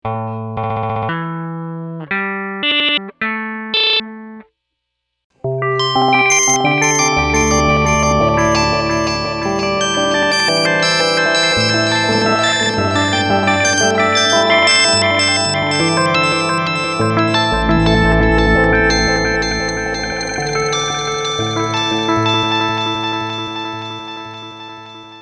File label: Stutter; Hedra Plus Ottobit Jr